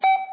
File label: countdown